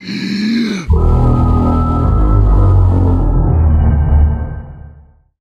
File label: SPECIAL MAGIC HORN FX